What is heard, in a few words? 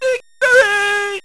Victory; Spades Deluxe